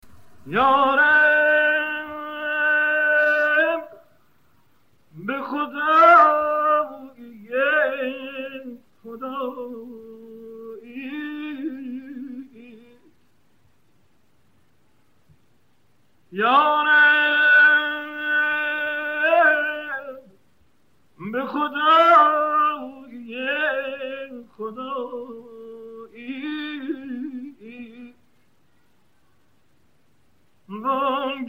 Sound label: leyli majnon